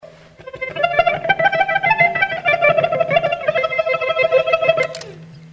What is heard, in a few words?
Great Short Tremolando